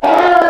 Chicken, Animals Wavetables; Cymatics; Serum; Animals for Serum - Gold Edition

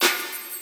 Percussion, Emin, Hip Hop

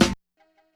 Snare, 085 Cmin

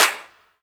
EDM Future Clap; Big EDM; Future House Tools; Claps

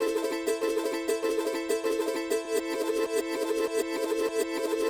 Charango; Hip Hop; 098 Amin; Hip Hop Exotica; Apple Loops; Big Fish Audio

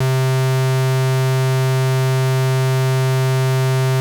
square, 808, SubOsc; hiphop; StudioLinkedVST 808 Kontakt

